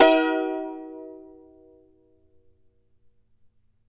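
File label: charango F minor down; Spectrasonics; Hans Zimmer Guitars VOL-2; CHARANGO CHD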